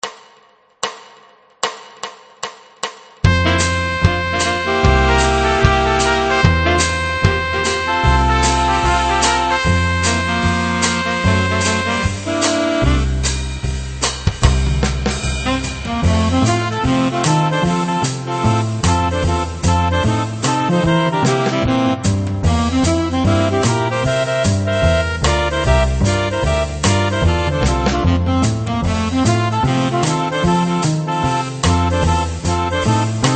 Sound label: Swinging Saxes 150bpm; Swing, verlangsamt zum Üben; 150 Viertelnoten pro Minute; einen Takt Vorzähler des Schlagzeugers